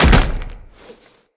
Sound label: Tenno Land Heavy Ice, Footsteps; Characters